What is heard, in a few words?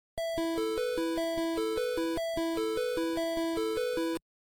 Unused Jingle; deja vu nes